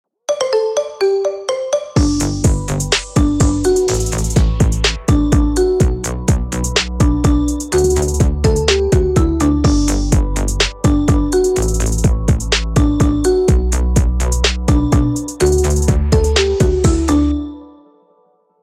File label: wolves marimba